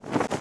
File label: Cloth Move; Civ4 beyond sword